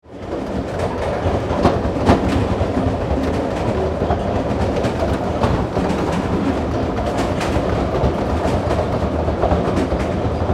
Passenger train inside perspective high speed; gfxsounds

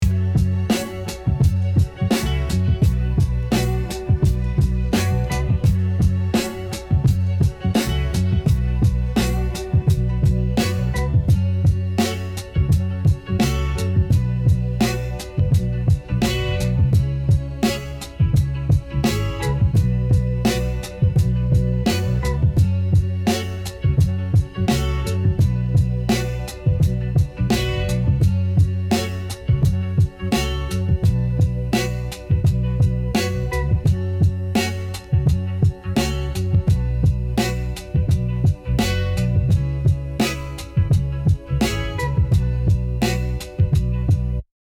Boom bap beat dusty echoes

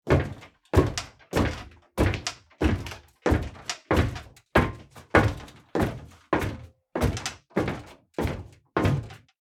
Footsteps on metal ship deck walking

